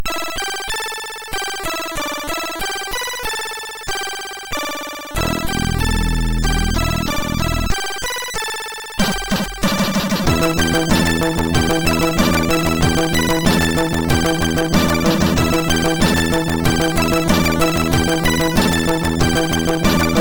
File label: Japanese Funk, sid MOS6581R4